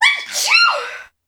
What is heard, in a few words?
Sneeze; hogstory